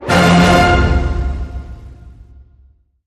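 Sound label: Hit Orchestra Triple F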